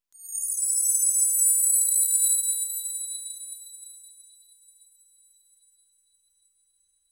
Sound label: gentle short sparkle, crystal chime